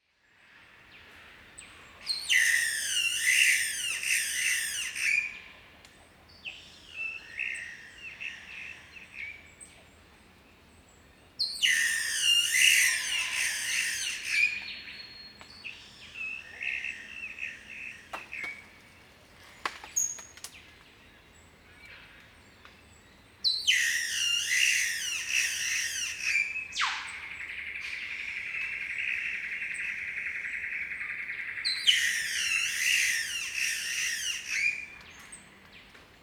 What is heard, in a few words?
Green Catbird Ailuroedus crassirostris